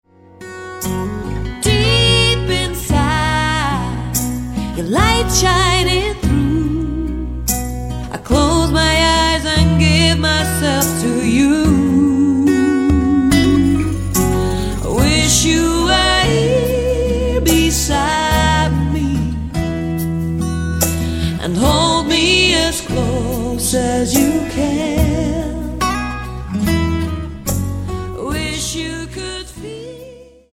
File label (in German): lied